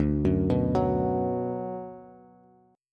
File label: D 6th D down Bass, chord; D6 arpeggiated; JGuitar